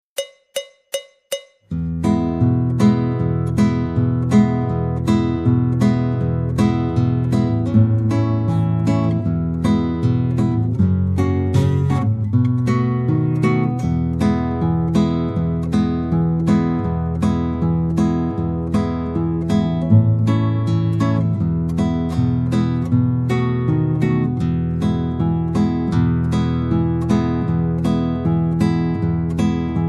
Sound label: jingle bells